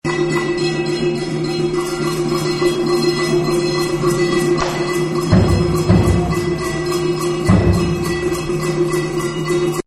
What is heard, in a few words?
Vietnam Perc Loop, Live Music Events; Concerts; Backgrounds